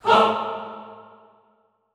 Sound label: HAH A2, Classical Choir, short hah; Best Service